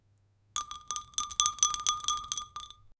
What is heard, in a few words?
champagne is poured